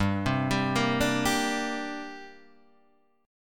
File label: D 7th Suspended 4th Sharp 5th, G